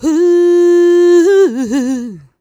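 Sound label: "uh" female, 127, e-flat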